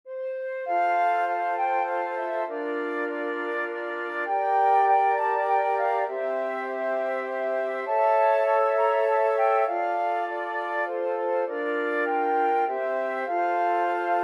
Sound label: we wish you a merry christmas, flute quartet